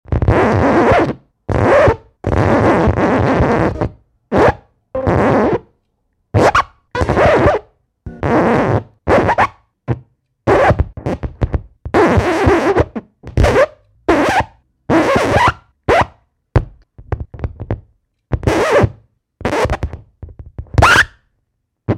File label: Record Scratches Vinyl; Various record needle scratches and moves; Entertainment System; Entertainment System Sound Effects